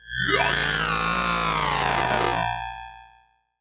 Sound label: Odd Modular; radiosonics